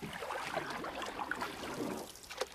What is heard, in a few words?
paddle; Golden Crayon Sounds